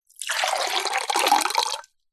food drink water pour into glass

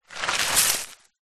stones and dust handful scoop up from ground; zapsplat; foley

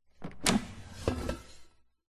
household air fryer pan remove; zapsplat

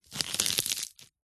food spinach leaves crush squash twist; zapsplat

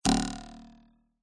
cartoon twang spring ruler

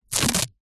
household band aid plaster peel off skin; zapsplat

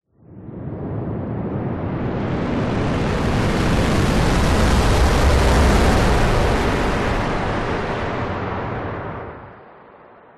whoosh; orlando lanzini

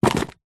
raffle ticket books x3 wads drop

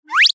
cartoon slip trip high pitched fast

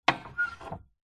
industrial lever pull squeak light

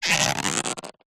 cartoon stretch pull elastic

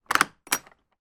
household wooden gate large latch open; sound effects one

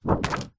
hospital xray sheet set down on surface

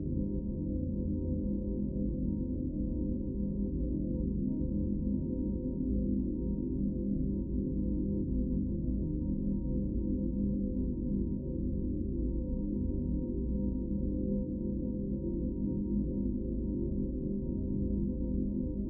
drone mellow dream chord with bass

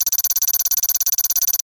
technology data read out burst

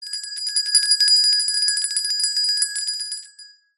bell small hand ring medium

